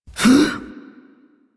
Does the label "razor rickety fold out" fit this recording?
No